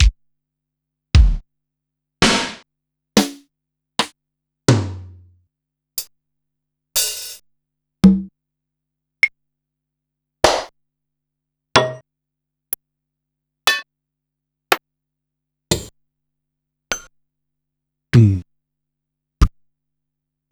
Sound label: Korg Wavestation-SR Waves Single Hits